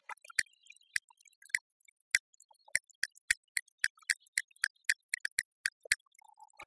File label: Castanets Violin Applause